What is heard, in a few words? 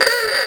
Horn, cartoon Foley Fx; musicradar; cartoon caper